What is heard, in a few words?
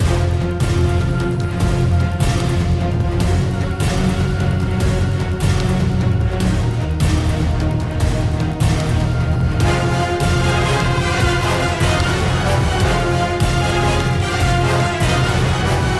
Battle Loop; Infinity Blade 3